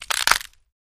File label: Celery Break Crack; gore stabs & eat